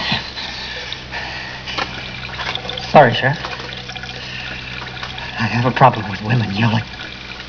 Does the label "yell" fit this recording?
Yes